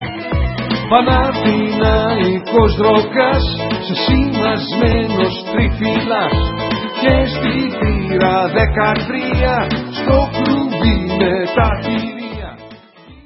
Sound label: rock n roll